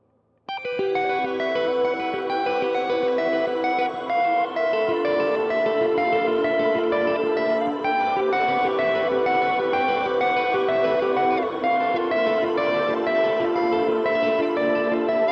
Reverb Shimmer

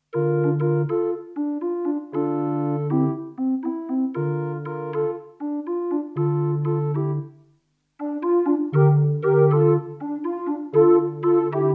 Yamaha SPX90 Symphonic Synth

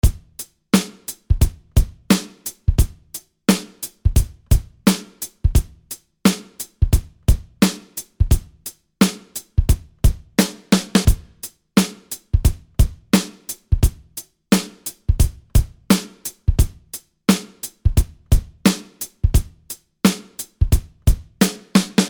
Drums Vitalizer Bass Mids

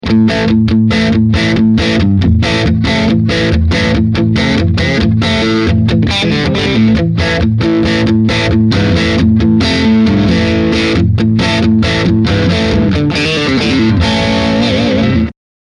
Rhythm Crunchy

